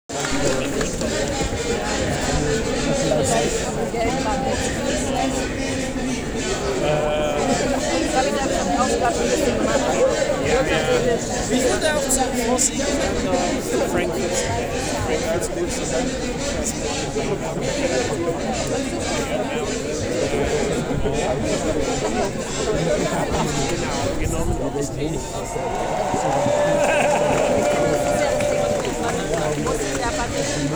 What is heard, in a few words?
foley